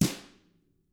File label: TAMA BR F, Drums; ILIO; Double Platinum Drums 1